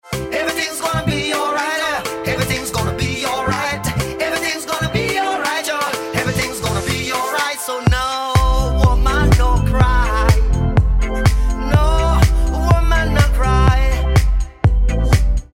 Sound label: BEAT